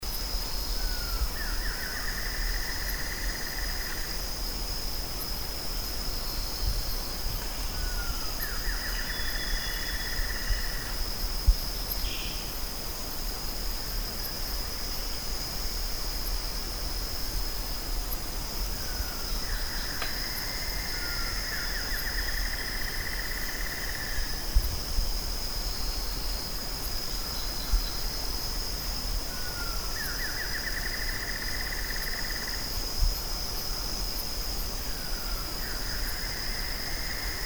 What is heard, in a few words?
paradise kingfisher